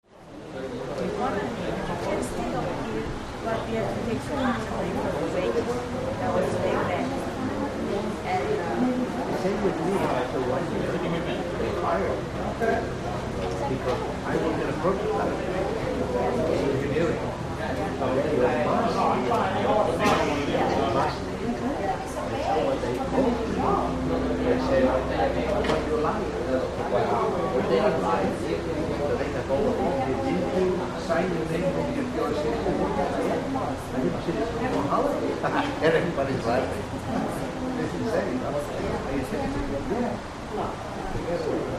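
Hotel Reception; Hotel Reception Area. Busy Chatter.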